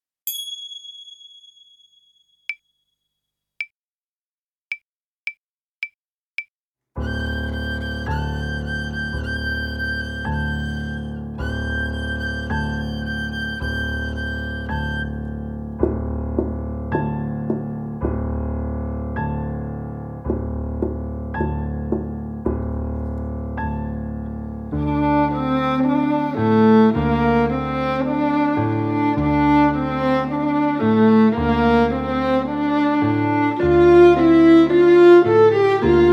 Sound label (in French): Alto